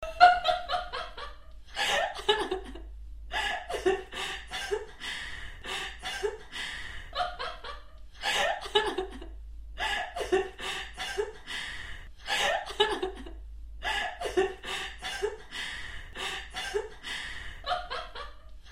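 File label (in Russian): смех девушки объемный 3D звук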